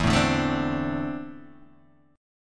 E 7th Suspended 4th Sharp 5th E down Guitar Open G